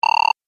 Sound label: Collect Chiptune, video game; Chiptune Fx